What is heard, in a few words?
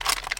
weapon remove; weaponweights content